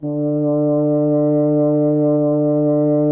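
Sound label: French horn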